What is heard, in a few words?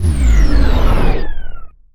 Scifi Dome Shield Deactivate; Energy & Forcefields